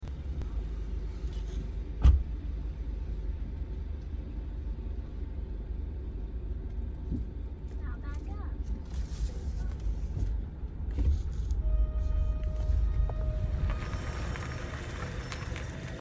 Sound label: mysterious whining noise when backing up; 3rd generation 2015